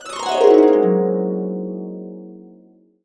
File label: Harps; USB Soundscan vol.62; AKAI